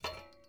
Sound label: ui pickup chainlink; Rust